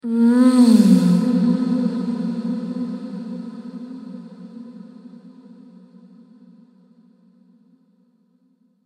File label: Femme Fatale Moaning With Reverb; Sex Sound Effects